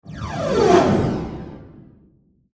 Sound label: scifi woosh